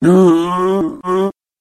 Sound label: monster bog demon